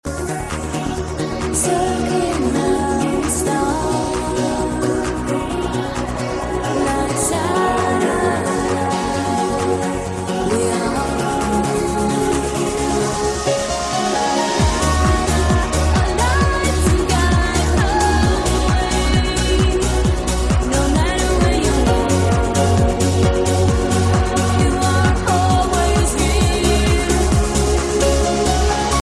nokia ringtone; nokia 5310 xpress

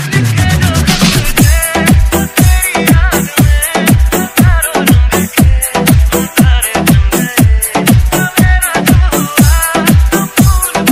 romantic ringtone; bollywood ringtones

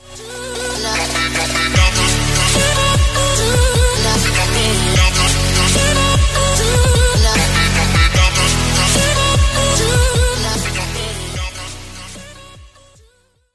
english music; english ringtones

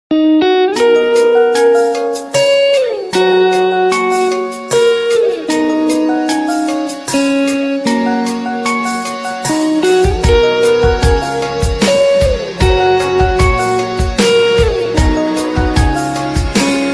nokia trike ringtone

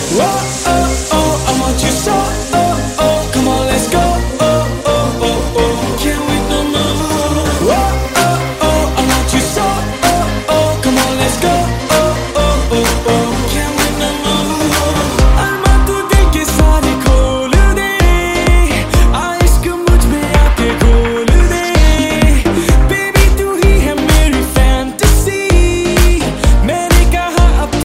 dj music ringtone, bollywood ringtones

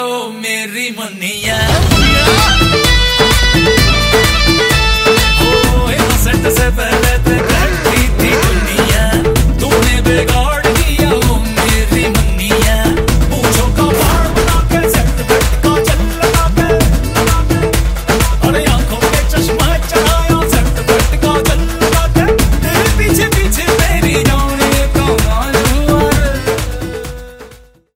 ringtone, bollywood ringtones; dabangg 3

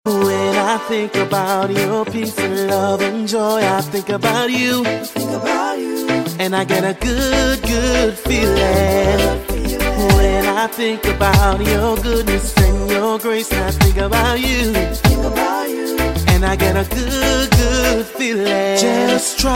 reggae gospel ringtone

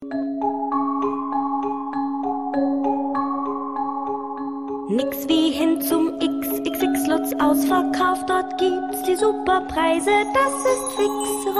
ringtone

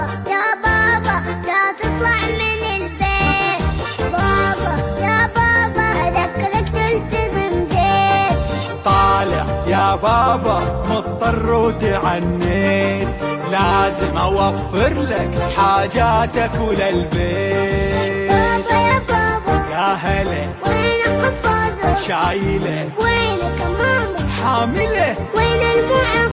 arabic ringtone; corona; islamic ringtones

